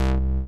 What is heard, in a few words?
noise